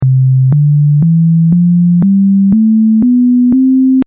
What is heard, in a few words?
sine